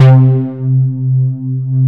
POLY SOFTS; Pro-Rec - Synthsonic

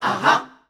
Aha, chants; Voices Of Africa; Short Chants Shots & FX

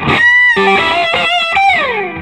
SOLO E, Vintage Blues Guitar

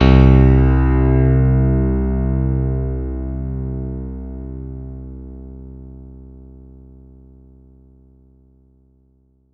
C1 DANCE, ANALOG SEQ; Roland JV 2080

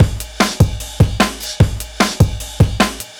Drum Loops, 150; YesCowboy; M8; Electronisounds

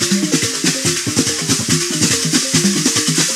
A# METALD0GL; Northstar; The Wizard VOL-1; AFROSIS

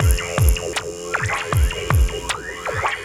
Indy Beat, Synchro Funk, Beats; Sonic Foundry (Sony Creative Software)

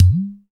POT DRUM, UDU

